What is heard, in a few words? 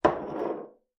glass on counter